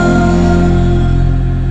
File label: MONSTER VO; E-MU Formula 4000 Series Vol. 2